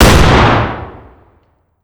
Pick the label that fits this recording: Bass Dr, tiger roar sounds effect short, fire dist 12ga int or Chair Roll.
fire dist 12ga int